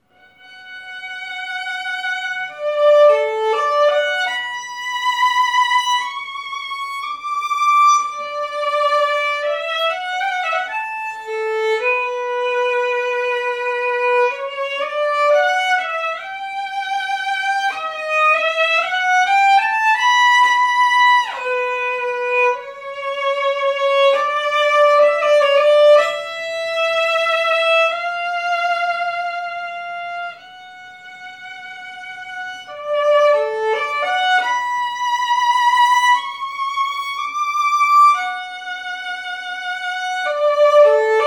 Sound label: Strad; Meditation from Thais